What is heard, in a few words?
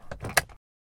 Glove Box Close